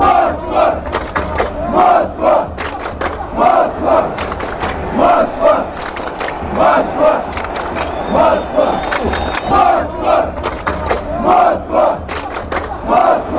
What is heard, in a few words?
claps; moscow